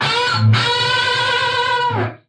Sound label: straight up guitar lick